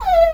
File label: pain blast; Apotheorasis